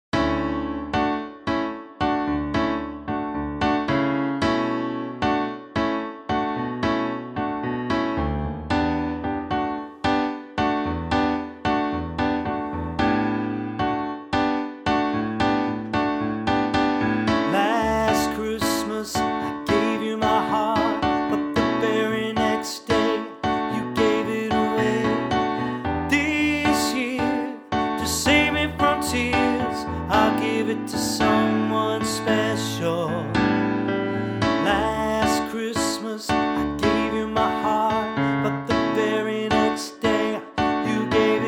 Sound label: Chorus